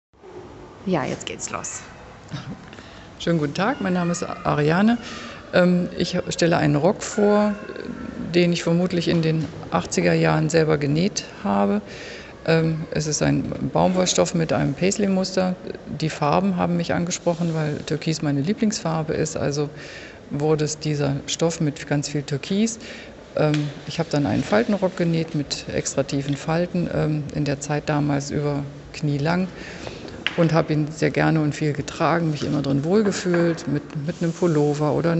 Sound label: rock